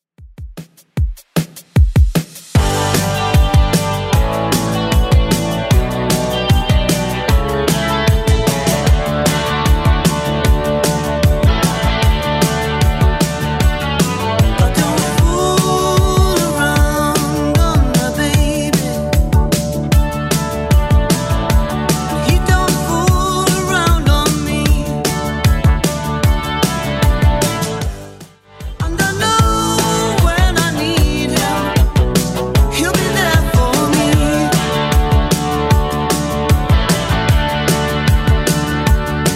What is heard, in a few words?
80s Redrum 152bpm